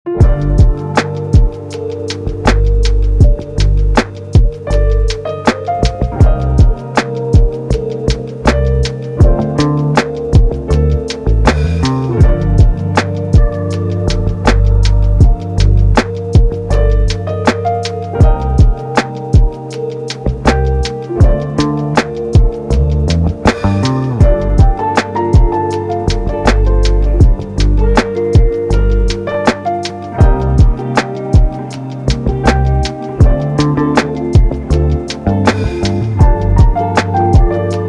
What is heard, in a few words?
lo-fi hip hop